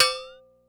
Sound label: Flx Brake, Percs